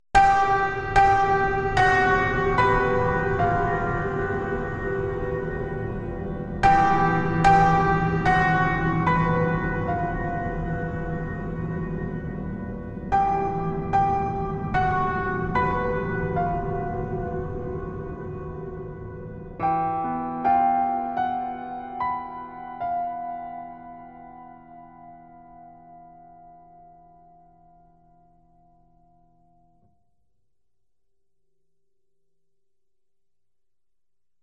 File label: Dark Transition